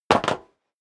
step sneaker metal dry jump; schritte

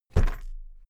briefcase plastic drop soft; industrie wirtschaft; bueroarbeit

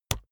keyboard laptop enter push hard

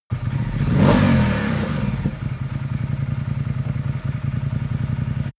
motorcycle ducati cruiser idle rev up; motorraeder; verkehr transport

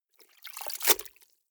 clothes water updown short hard, haushalt; haus garten

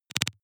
noise clicks splutter low; maschinen; signale; soundpool

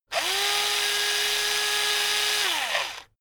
electric drill screw out long; soundpool

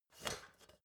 step ladder metal scrape single

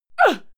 female fight attack hit short; schreie; menschen

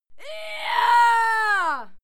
female cheer yah; schreie; menschen

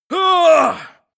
male fight long; schreie; menschen; soundpool

